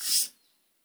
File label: slide; opensurge